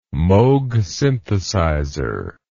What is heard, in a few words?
moog synthesizer